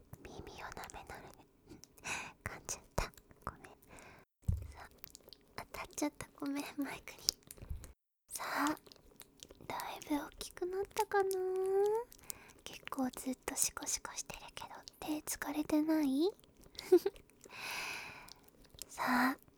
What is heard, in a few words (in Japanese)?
耳舐め; 耳舐めで二度目の射精に挑戦